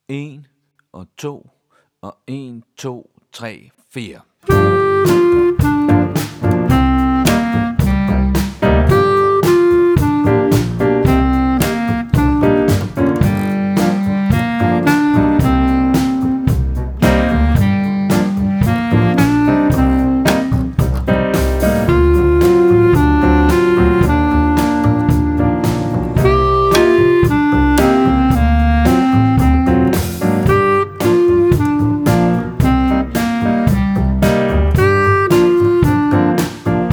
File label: Lazy Beat